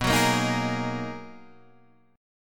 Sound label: B Major 13th B down Guitar DADGAD